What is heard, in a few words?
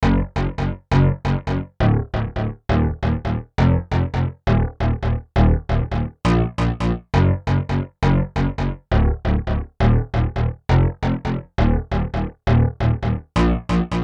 Micro Pitch Synth Bass; UltraChannel; Preset: H8000 MicroPitch